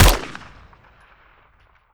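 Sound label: mike fire player, weapons; gmod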